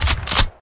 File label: shotgun reload full; minetest